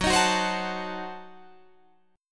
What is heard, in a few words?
Gb Diminished 7th Guitar Drop D